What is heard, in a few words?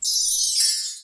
jingles